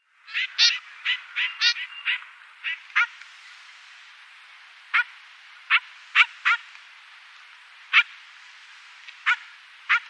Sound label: Trumpeter Finch calls